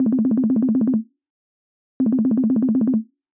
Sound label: call, ringtones